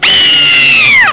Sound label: aaaahh; blackjack trainer